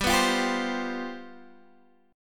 C Major 7th Suspended 4th, G, guitar chord

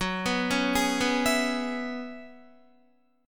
Gb 7th Suspended 2nd Suspended 4th Gb down Guitar; Gb7sus2sus4; arpeggiated

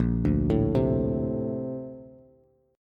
A sharp Major 9th, Bass; arpeggiated

